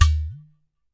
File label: udu HIT, Udu Clay Hand Drum; ETHNIC and WORLD PERCUSSION